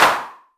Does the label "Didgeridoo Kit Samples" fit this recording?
No